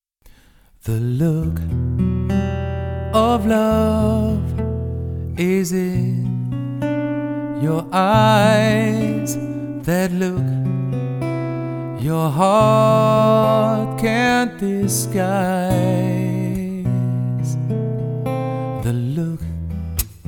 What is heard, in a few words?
solo unplugged